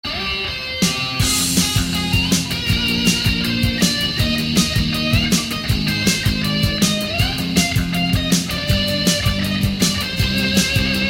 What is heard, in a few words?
tuman solo